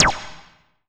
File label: BLIP, Anime Sound FX, SCIFI